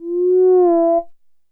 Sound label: QUICA, DRUMS; Music Store Sound Service; Einstein